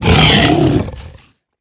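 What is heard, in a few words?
hoglin death, 疣猪兽：死亡; Minecraft; 我的世界; 疣猪兽是一种生成在绯红森林的攻击型生物。它们是目前唯一可繁殖的攻击型生物。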